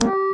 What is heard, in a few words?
Blueface Smack Start; oxeyewiki